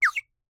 Birdwhistle, clay, painted, water, airy, wispy, clear, solo chirp; Eiravaein Works; Vaeyan IV